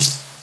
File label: HH, Dance Drums; Best Service; Dance Mega Drums